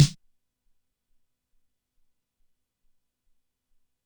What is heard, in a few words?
sn, Drum Machines, ELKA X1000